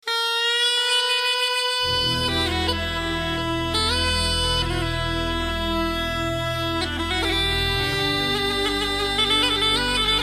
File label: Old Bulgarian